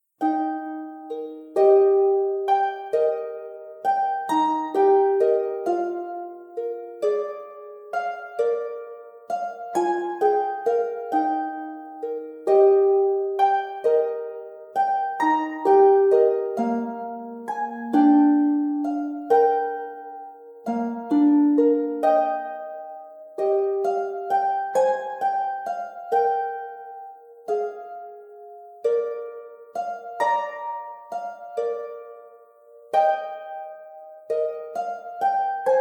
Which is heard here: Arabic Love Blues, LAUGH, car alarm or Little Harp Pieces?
Little Harp Pieces